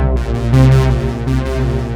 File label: DANCE CHOR; E-MU Formula 4000 Series Vol. 2; Dance Hits